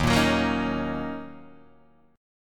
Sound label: D sharp Minor 7th, Guitar, DADGAD